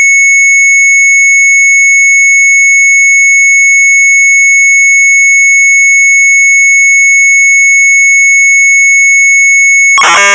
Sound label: MZ800 Tape; Sharp